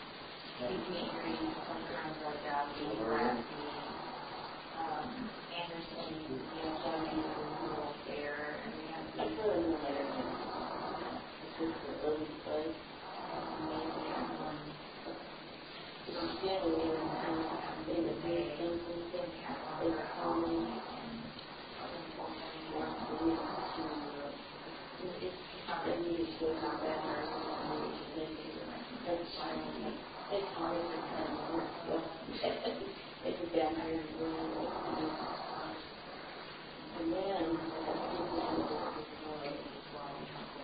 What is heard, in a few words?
heavy breathing; evps